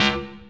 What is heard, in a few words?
stab; Dirt-Samples